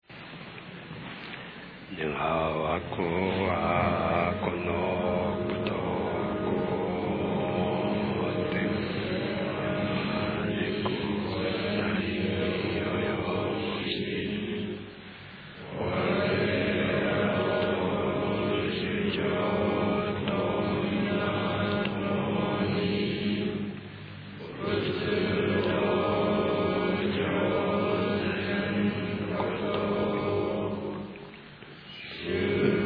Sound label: end chant